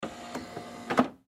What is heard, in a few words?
Printer Off; Printer Turn Off Paper Adjust Computer; Printers; Office; Blastwave